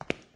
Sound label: footstep